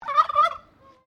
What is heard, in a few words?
Bird Turkey call, Domestic Turkey; Turkeys; Animals